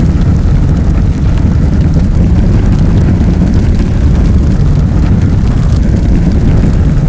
attack fire field medium

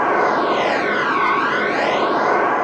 force speed

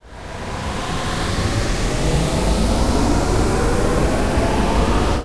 engine startup

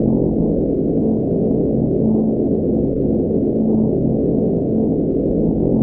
attack spike trap

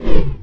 wep lance; massassi

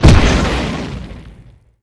exp medium generic

